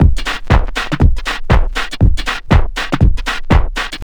house loop

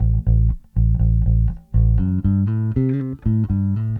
Bass, 70s Analog Funk

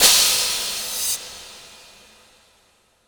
Crash, impact samples

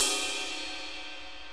Light Ride, Cymbals; Sonic Foundry (Sony Creative Software); Loops & Samples Drum Tools

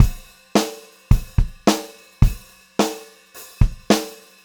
POP VARI, Pop-Rock Drumloops; 108 POP

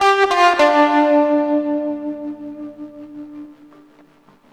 FX03, FX guitar; USB Soundscan vol.31; Miro s Grooves; AKAI

